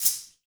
ANKLE, METALS; Spectrasonics; Liquid Grooves 1 & 2

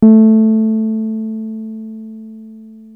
303 A3, TB 303, Synthesizer; Hollywood Edge; Giorgio Moroder Rare Synthesizer Collection

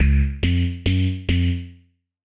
Har Bass Riff Db F Gb, 140bpm; Zero-G Sound Sense Grime Scene; Construction Kits; Astoria

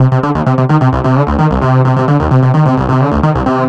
NRG Bass, Trance

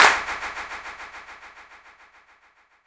Long Clap; Zero-G Jungle Warfare 1, 2 & 3; 90 s Sample CDs

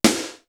BRUSH DANCE; East West; Global Sounds

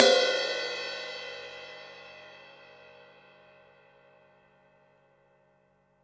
cymbal, deep house single hits

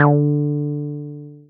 Synths BASS

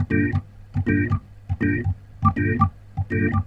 Roots Reggae, B-3; Sounds Good - Roots Reggae

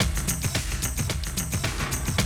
Classic DrumLoops, 110

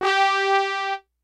HIPHOP HORNZ; Kid Nepro - Elite

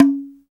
BONGO, ETHNIC PERC; Roland LA

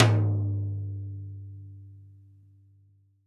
TD-20 Tom A, Roland TD-20 Toms

